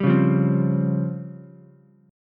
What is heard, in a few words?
Db Major 7th Flat 5th, Ukulele; DbM7b5 strummed; JGuitar